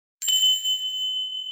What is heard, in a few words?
Bell sound